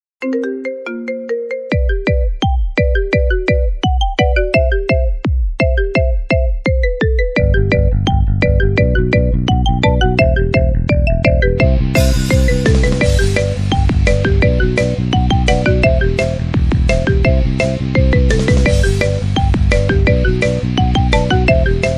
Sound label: marimba remix, marimba ringtone; the office